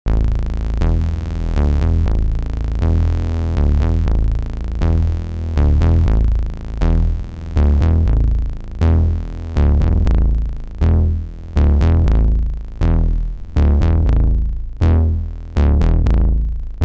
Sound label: Arturia Polybrute FilterBass with High and Lowpass